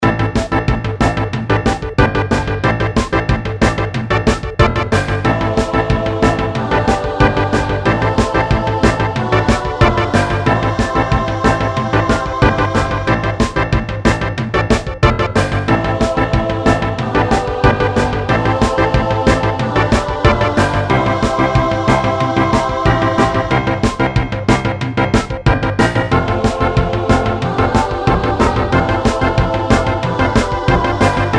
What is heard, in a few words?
music bg; Metal Bot